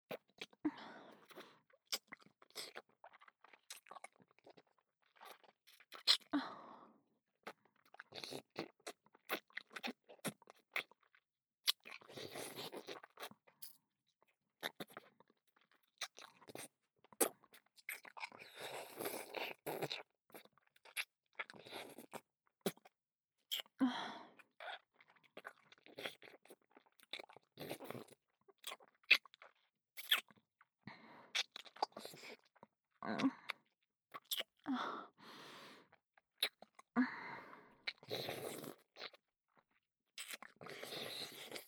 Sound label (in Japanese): キス, ASMR; アドリブ集